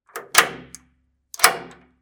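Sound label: clock; zvuk zasova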